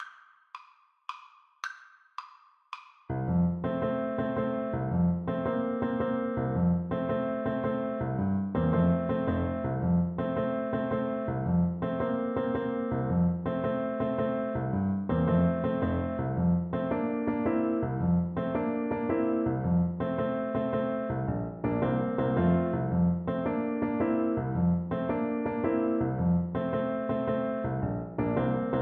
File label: Clarinet